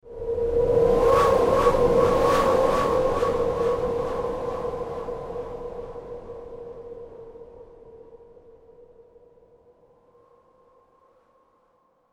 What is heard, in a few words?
Evil Wind Gust Horror Vocal, Evil Wind Gust Vocal Scary Dark Ominous Demonic Whoosh; Ghosts